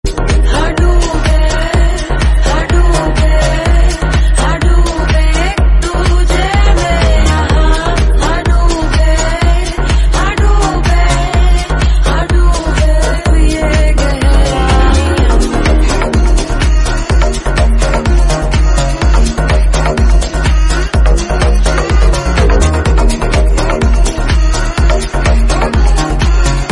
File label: Remix Song Ringtone